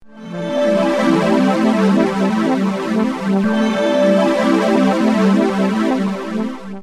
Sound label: Chimes Deep Tone